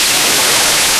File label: fleshy b, burst; flesh break